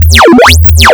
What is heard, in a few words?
Sine with wahwah